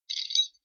key pickup

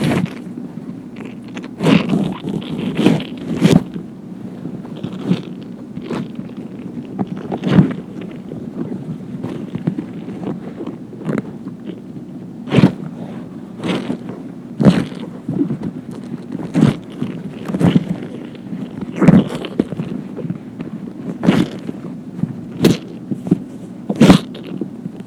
ripping into meat

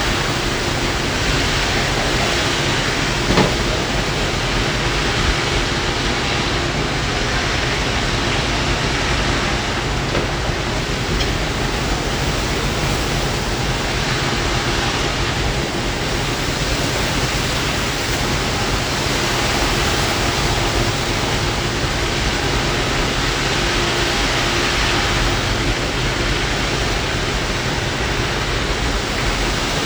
amb outdoor loop; Outside

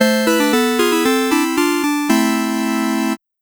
Game Over

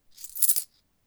coin clicker

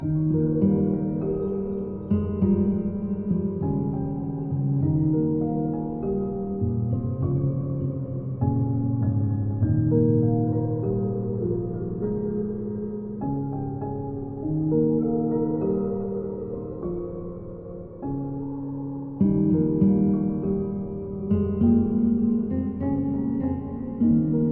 Lonely Echoes

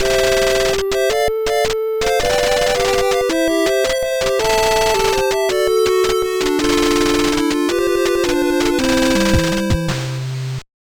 victory

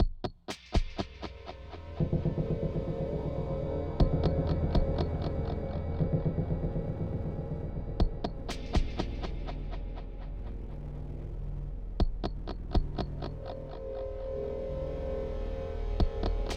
sabotage tense

